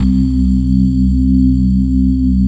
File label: BELLY SYNS, SYNTHS; Pro-Rec - Synthsonic